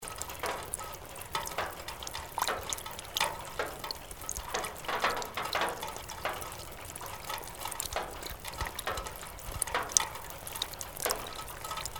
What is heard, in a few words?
rain metal drip